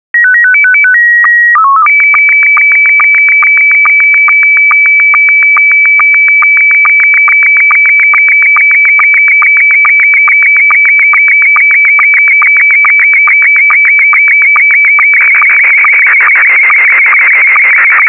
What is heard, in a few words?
sstv scottie1